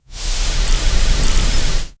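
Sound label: a slow deliberate compression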